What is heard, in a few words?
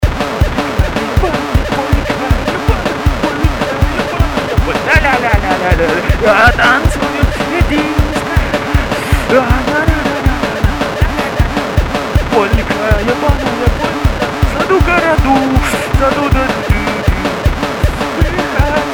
polka